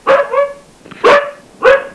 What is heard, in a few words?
bark